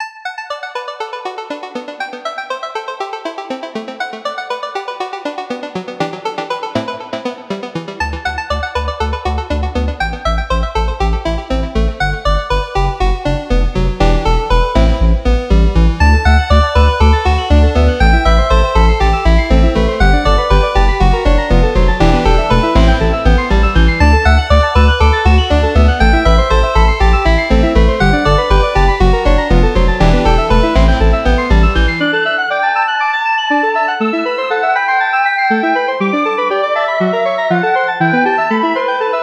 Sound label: melody forest